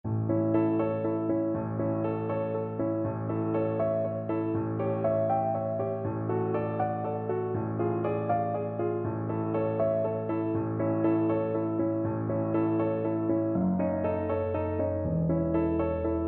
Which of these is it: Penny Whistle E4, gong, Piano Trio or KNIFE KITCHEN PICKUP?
Piano Trio